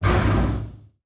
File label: distance plasma hit; weapons; Stellaris